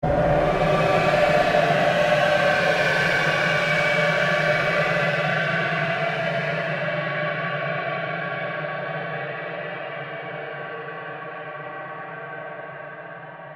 Created Pulse Fade Long; sound building blocks created through manipulation of raw material; Rise And Dive

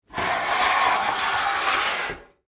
Sheet Metal Scrape, Metal, Surface; Factories; BlastWave

